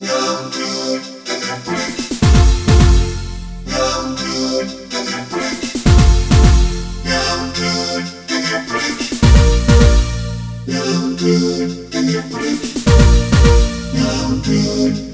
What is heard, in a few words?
- , Korg Radias-R